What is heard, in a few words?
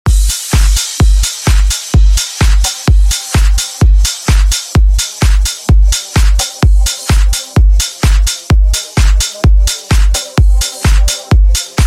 Funk 128Bpm; Bootleg